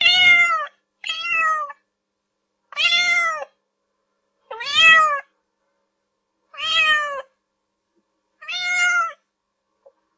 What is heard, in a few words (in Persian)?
صدای میو گربه; صدای دلپذیر برای جذب گربه